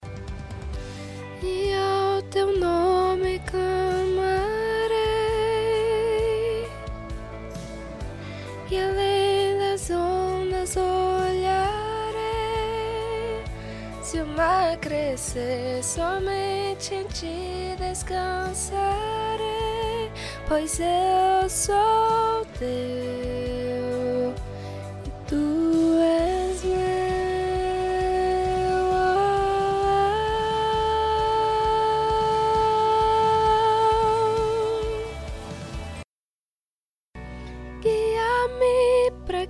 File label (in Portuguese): SOPRANO